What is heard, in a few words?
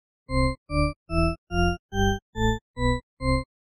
shepard